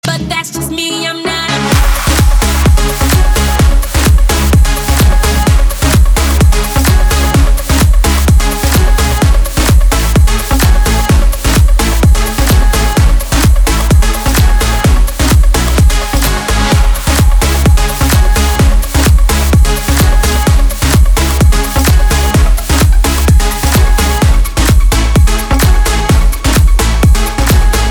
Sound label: Vox